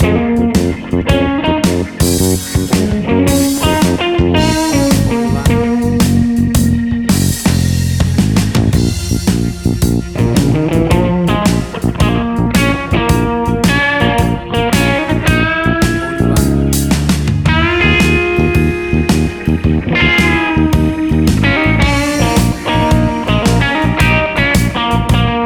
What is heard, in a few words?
Blues Bounce